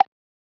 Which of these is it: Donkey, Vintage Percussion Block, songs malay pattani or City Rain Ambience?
Vintage Percussion Block